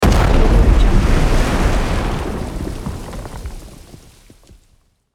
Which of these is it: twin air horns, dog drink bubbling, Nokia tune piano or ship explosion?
ship explosion